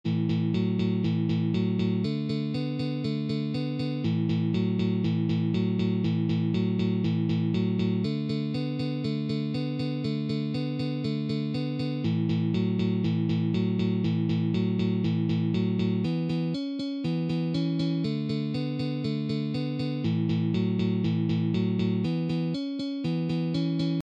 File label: shuffle in a